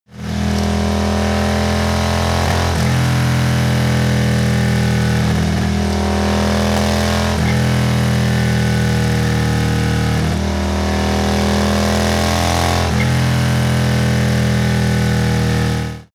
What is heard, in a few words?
motorcycle harley engine thrusts